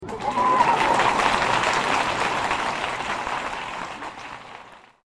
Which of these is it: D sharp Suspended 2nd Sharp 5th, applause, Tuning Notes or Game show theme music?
applause